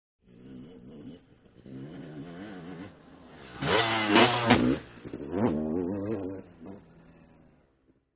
Motorcycle Motocross; By 125 Cc Honda, Cu Fast By R / L, Shot Cu.